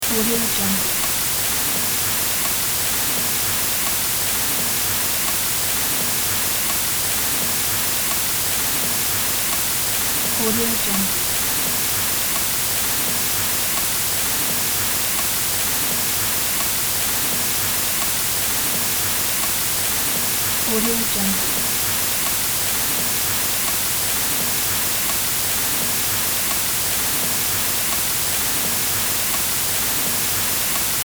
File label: rain heavy